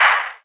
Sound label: snarelike; Sonic Foundry (Sony Creative Software); Ugly Remnants VOL-2; Beatstuff